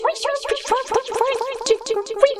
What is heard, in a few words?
Sound Alien